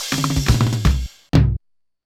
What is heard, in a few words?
Loop, mxr; classic machines